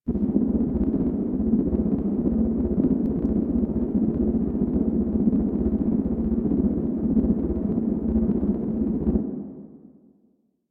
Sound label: Orbiter Hallway; Warframe; Liset; Hub; Lower Deck